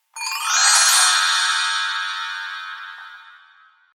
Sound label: Chinese Bell Tree Gliss; Bells; Musical